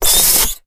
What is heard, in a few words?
box slide, styrofoam